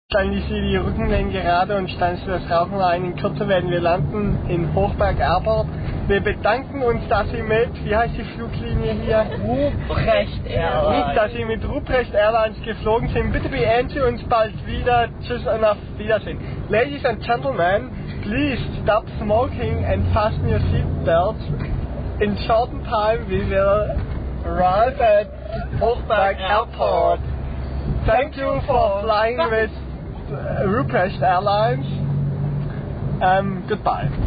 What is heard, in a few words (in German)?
Flugzeug